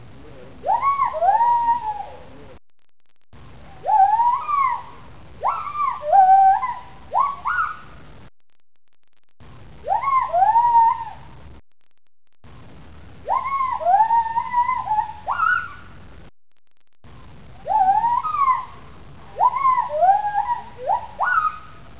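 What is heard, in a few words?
agile gibbon male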